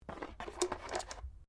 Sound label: dice medium cardboard box pickup; Variety of dice sounds; Casinos